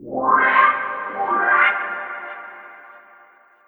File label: ORCHFX, orchestra, Asian Traditions